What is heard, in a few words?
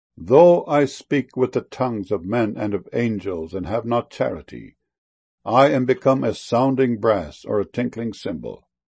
cymbal